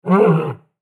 Lion Roar Fast; Big Cats; Animal

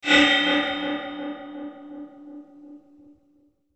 Metallic Multiple Hits Dark Reverb; Metallic Sound; Metallic Sound Sound Effects; Production Element Imaging Element Accent Transition

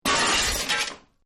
Glass Breaks Pane, Pane of glass breaks; Materials

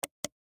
Mouse Click Single; Pc; Computer; Technology; Electronics; Blastwave

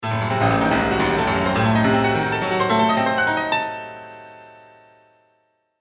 Cartoon Music Piano Dramatic Ascend Spooky

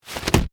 Body Fall; Wrestling and Struggling; Combat

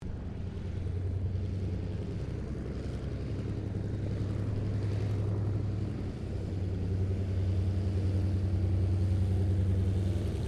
AIRCRAFT CAC WIRRAWAY TAXI STEREO

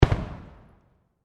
Firework Explode Distant; Fireworks; Backgrounds

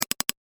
A Series Of Clicks

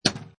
Pinball Sound Chunky Clank; Video Games

